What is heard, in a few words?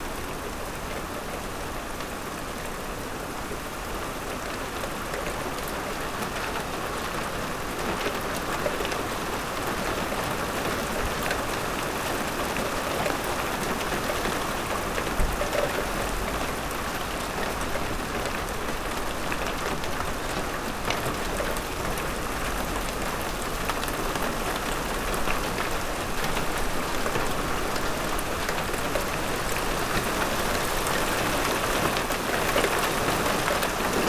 Field Recordings Oregon Rain Light Thunderstorm; Omni Gardens